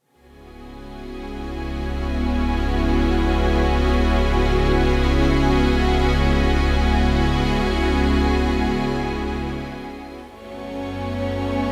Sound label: calm strings; mystisch-geheimnisvoll